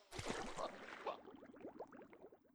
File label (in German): charakter ertrinkt; battleblock theater